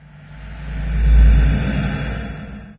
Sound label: hole hit, ambient atmosphere; source engine; hl2; video games